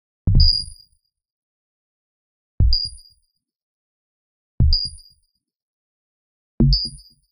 Arcade Click Negative; boom library; The Interface

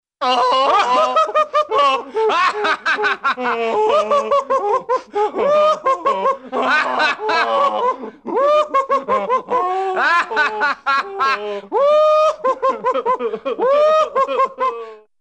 Man Crying w-other Man Laughing Happily; Cartoon; Hollywood Edge; Cartoon Trax

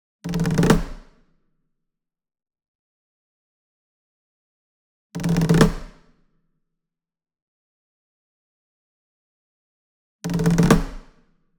SINGLE DRUM Shime Daiko Roll Near; boom library; Cinematic Strikes Construction Kit

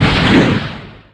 Rocket Launcher Shot; SFX Kit; Weapons

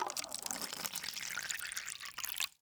Pour Coffee; Liquid; 1001 Sound Effects

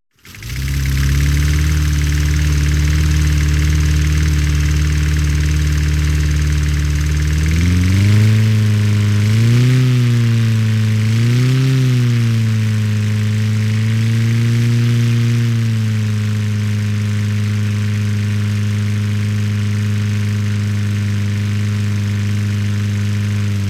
Lawn Mover Motor Powered; KMP Film Video Sound Effects

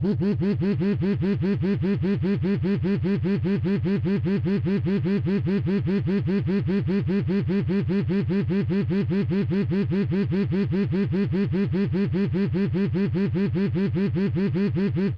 phone vib rapid; devices accessories utilities; NS Studios - ultra sound collection

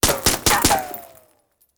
Bullet Ricochet; Sound Ideas; Guns

